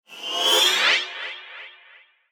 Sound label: DrillGolem What